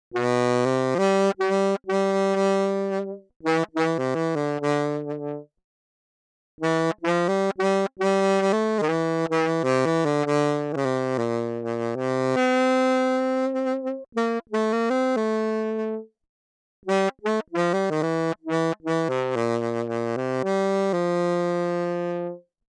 sax t

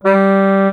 morphed sound

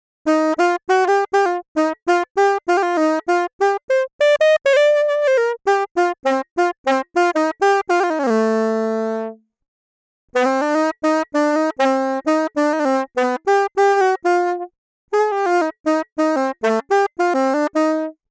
sax s

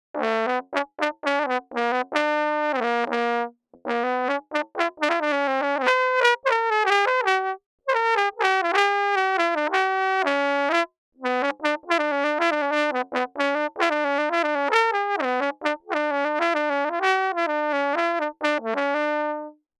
trumpet

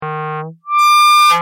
squeak

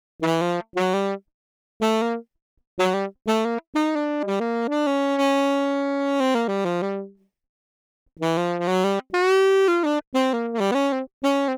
sax a

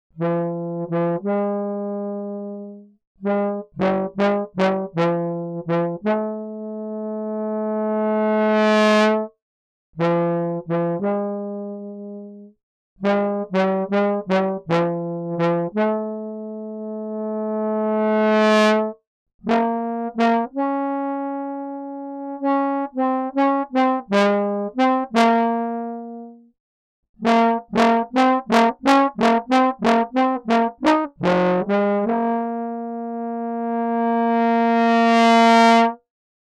trombone

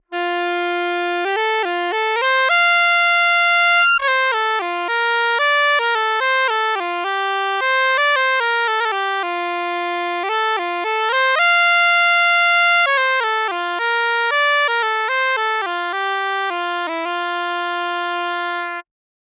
bagpipe

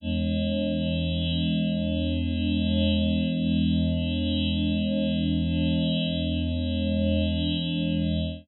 rotating partials

